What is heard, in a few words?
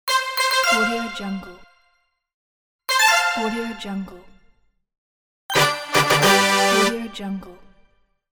game win